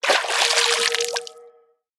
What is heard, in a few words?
Removable Water Tone